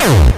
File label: Acid Sounds H, Synths; Vengeance Samples